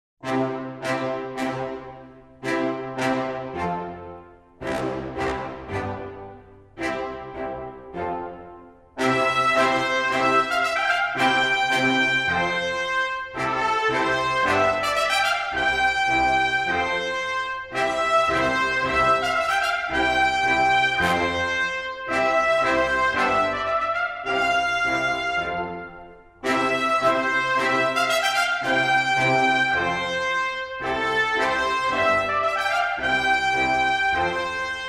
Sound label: Instrumental